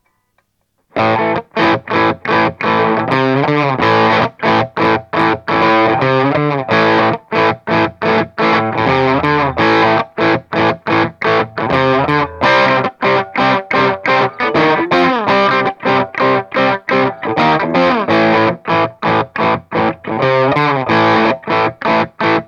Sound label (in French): crunch G1 SP micro chevalet double